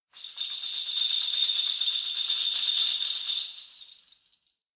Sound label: Kagura Suzu